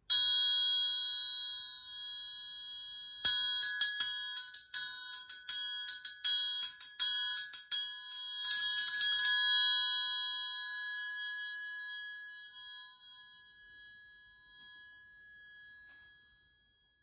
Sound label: Triangle